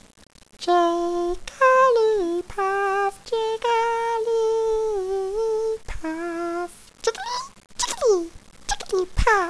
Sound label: jiggly